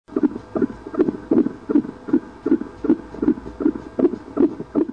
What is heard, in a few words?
heartbeat